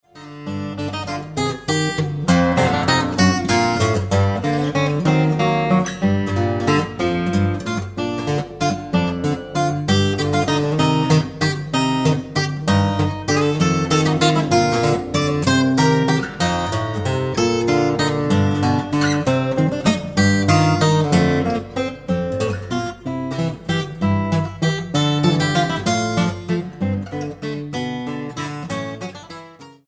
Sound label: Waltz